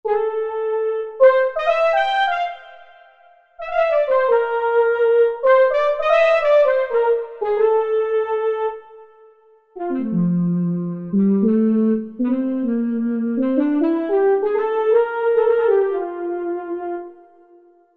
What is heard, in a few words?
Singing Sax, Korg MSC-11S Brass